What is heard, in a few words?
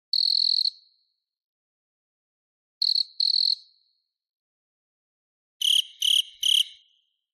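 chirping of a cricket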